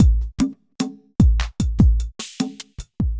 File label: evil beats 75